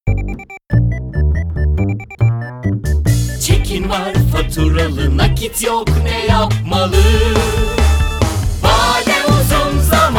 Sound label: jingle; YASAR FACTORING